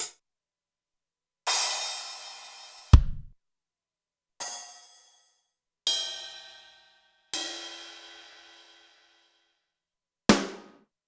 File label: Zoom MRT-3B Kit; Sliced